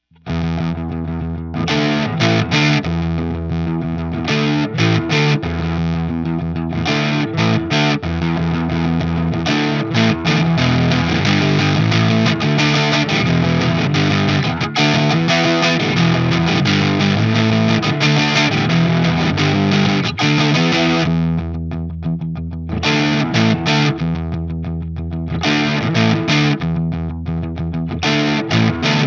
TRIWATT 12AT7 PRS Humbucker Middle Greenbacks; trinity

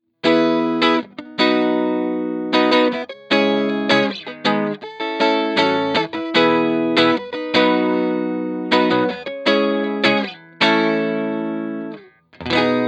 18W CLEAN Tone Tubby Alnico

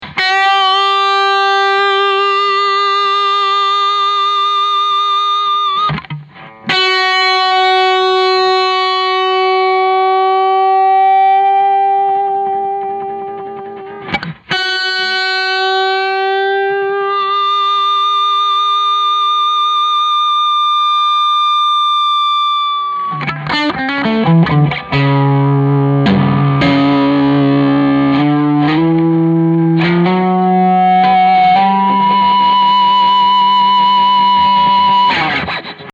335 OD Ctrl Feedback; Trinity OSD Amp; ODS

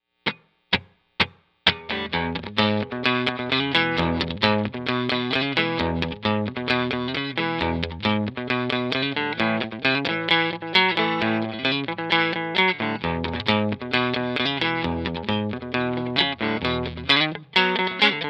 12AT7 Tele Neck Tone Tubbys; TRIWATT; trinity

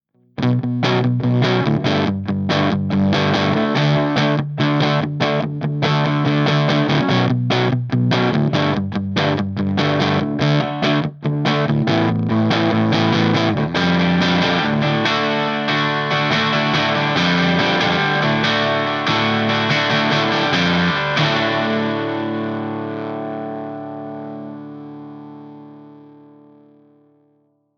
DIRTY Orange V30; JTM

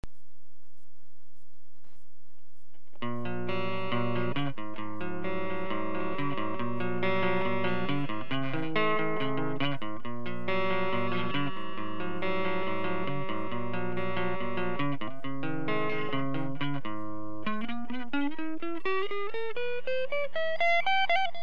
Tweed Clean Strat; dialled down to a clean-ish sound

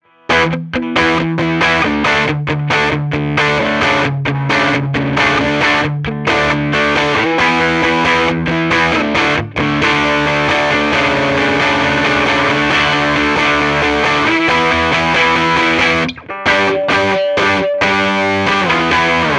TC15 DIRTY Classic Lead 80

